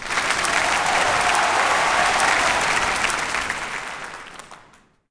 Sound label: CRWD; THQ BOWL